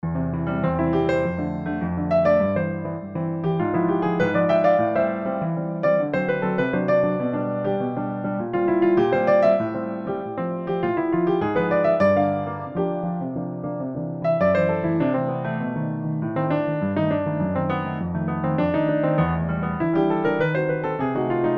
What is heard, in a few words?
Piano chorinho